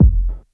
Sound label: BD Club Filt, Bass Drum; Lost Tapes From Mars